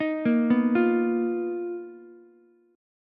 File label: B Major 7th Flat 5th B down Ukulele